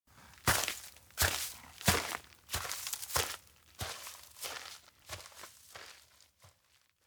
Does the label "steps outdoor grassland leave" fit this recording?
Yes